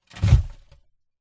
horror coffin mud dirt small stones hitting coffin box being burried internal; internal coffin pov